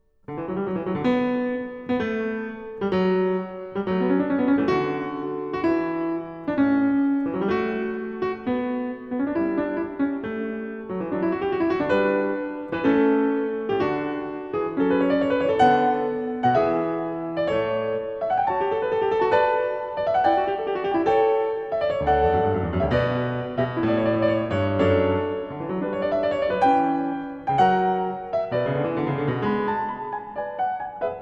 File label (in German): Fuge D-Dur; BWV 850; J.S. Bach; Das Wohltemperierte Klavier Book 1